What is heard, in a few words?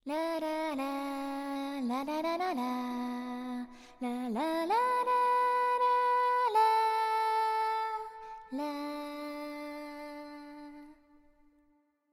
BGM ADV Sing Side Bed; aster tatariqus android ios mobile gamerip 2023